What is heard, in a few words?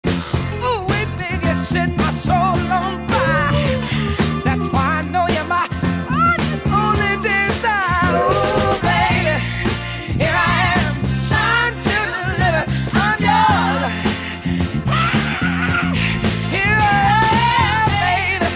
breathing